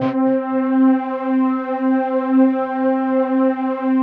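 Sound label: PAD, atmospheric pads; Best Service; XX Large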